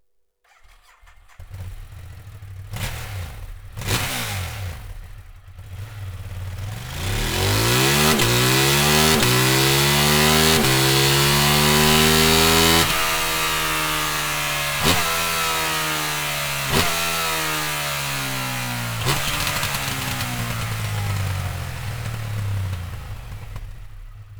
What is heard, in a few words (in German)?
Serienauspuff